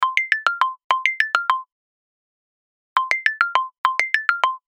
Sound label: Synthetic Xylo; Alcatel Pixi 3